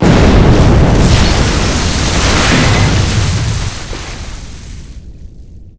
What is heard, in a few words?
wall crash, machines; Half Life Model Pack; Half Life 2